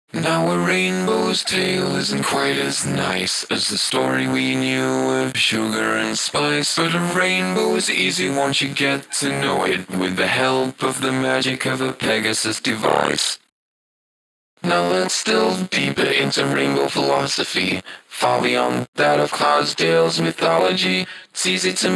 Vox 148 Bpm

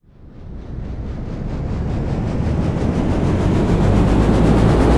fan on; CounterStrike; cstrike

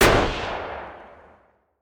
heavy gunshot; factorio; Factorio 0.14.21; fight

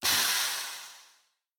train breaks; factorio; Factorio 0.14.21

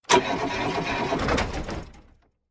tank no fuel; factorio; Factorio 0.14.21; fight

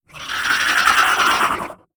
biter call; factorio; Factorio 0.14.21; creatures

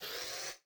inserter basic; factorio; Factorio 0.14.21

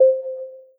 count down; MarbleMarcher

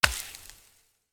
projectile acid burn; factorio; Factorio 0.14.21; creatures

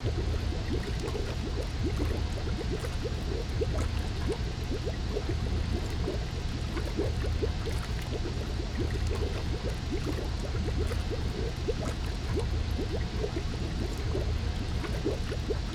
chemical plant; factorio; Factorio 0.14.21

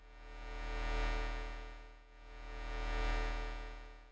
substation; factorio; Factorio 0.14.21

